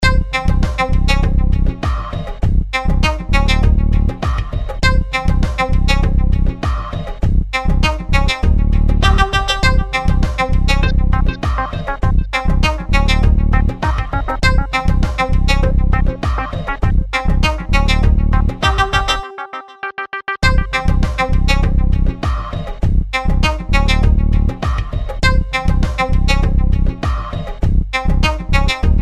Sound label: Mystic Tone